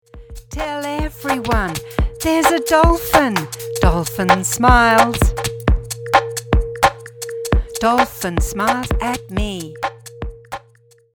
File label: Dolphin Smiles Vocal, Sea theme preschool music activities; Musical Child